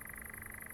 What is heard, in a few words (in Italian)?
succiacapre bergamo; flammeus